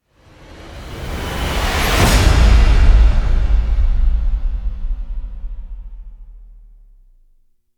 Hit Swell; Ninja Tracks

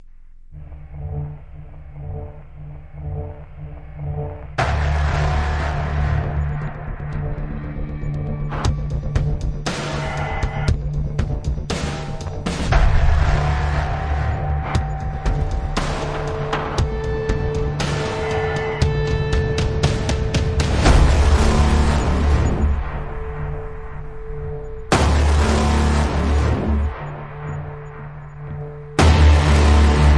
No Guitar Lead

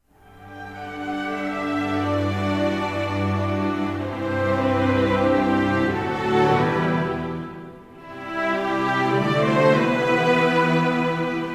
Orchestral Version

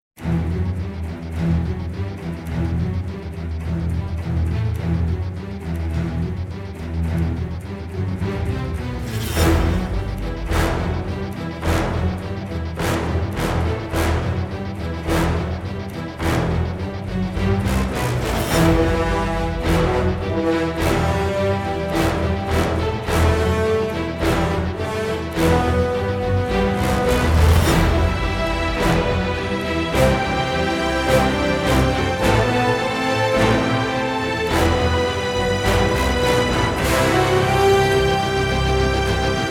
No Perc, No Choir; Brand X Music

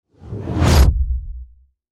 Strip Hit Wipe; Ninja Tracks

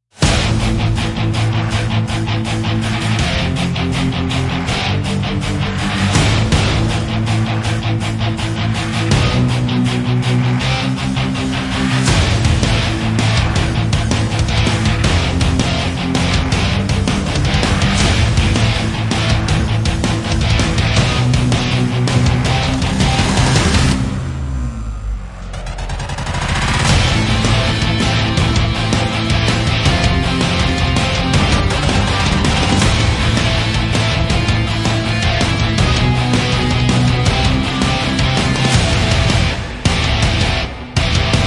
Band Only; Gothic Storm Music

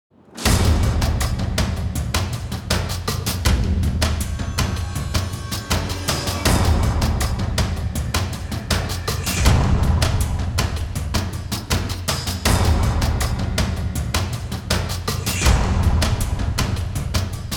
Percussion; Fired Earth Music

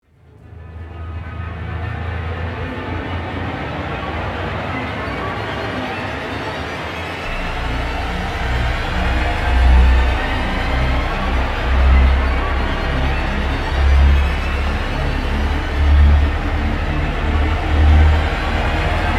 Rise; Ninja Tracks

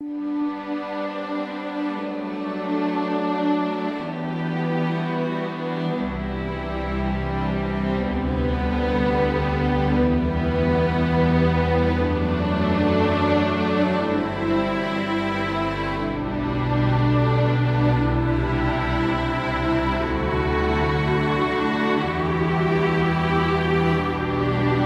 Greatness Ambient

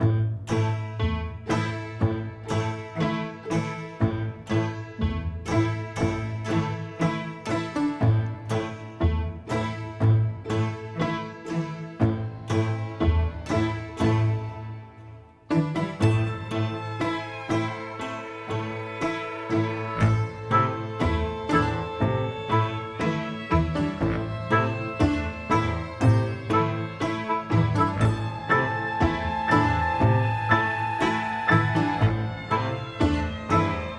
Stoned Ambient

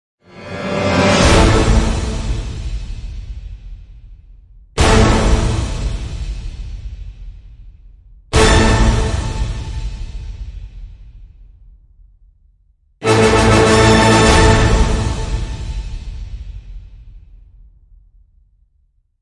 Orchestral End Hits (A); Epic Tools vol. 1

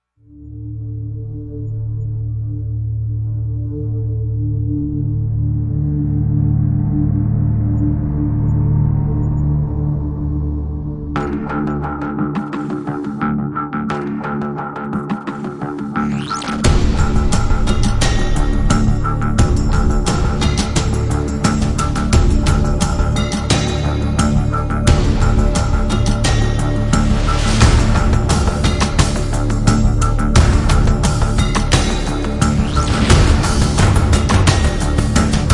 No Guitars; Ninja Tracks

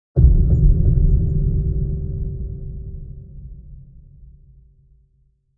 Tremmor Hit Sub

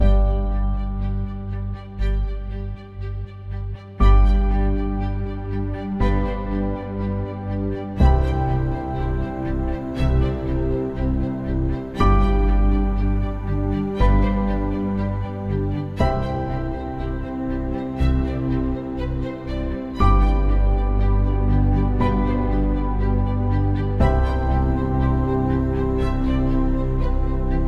Effortless Ambient

